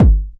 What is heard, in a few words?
kick techno, drums; Techno Trance Essentials